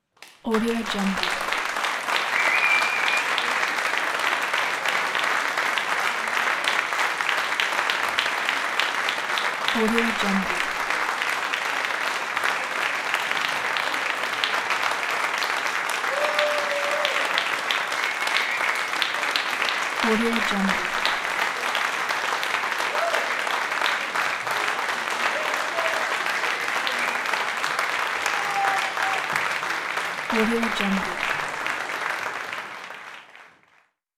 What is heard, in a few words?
theatre applause